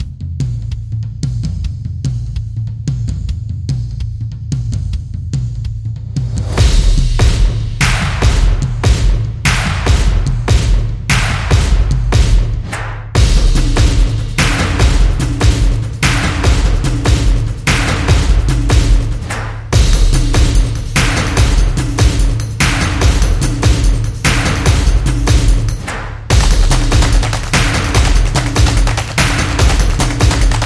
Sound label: A Drumming; high energy